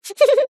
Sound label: cartoon character cute high pitched giggle laugh short